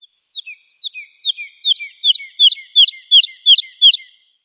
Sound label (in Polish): Skowronek